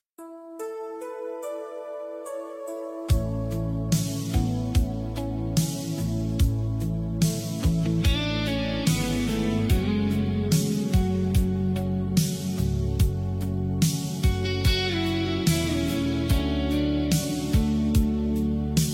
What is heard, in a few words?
heartfelt love song soft rock